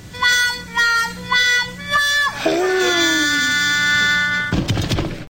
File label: fail horn